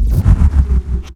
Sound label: FX Reverse; FX Reverse Series